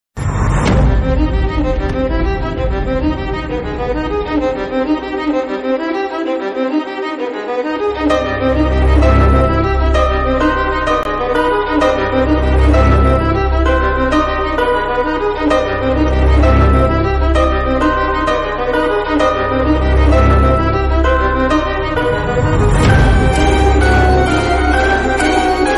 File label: tamil mass bgm ringtone